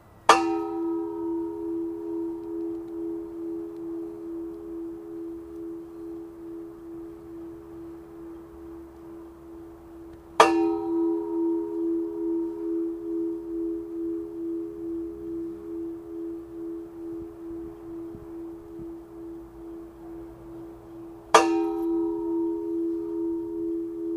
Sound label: stomp